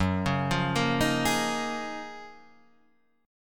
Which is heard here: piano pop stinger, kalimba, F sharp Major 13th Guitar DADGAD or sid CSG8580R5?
F sharp Major 13th Guitar DADGAD